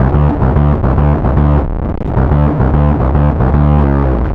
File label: Distorted D, Bass; Effected; Bass X